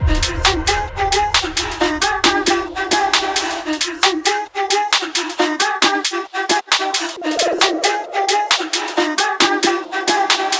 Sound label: 134 bpm Fm